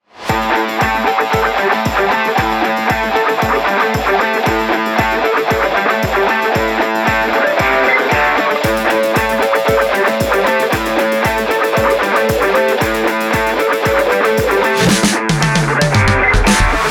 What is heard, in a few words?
Sport Music